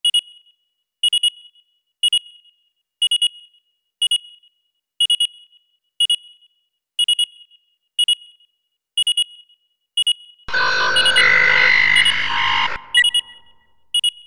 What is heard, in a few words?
satellite ambi